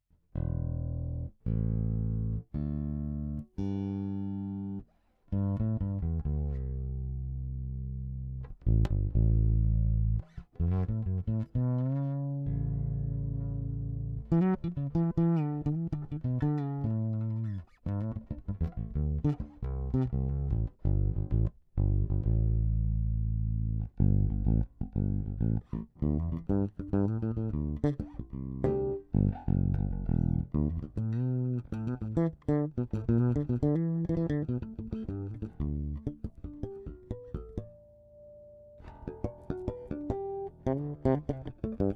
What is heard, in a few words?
bass; Magnetisk; warmoth